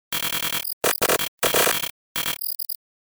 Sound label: Glitch